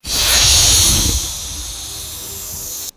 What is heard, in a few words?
futuristic pneumatic air release hiss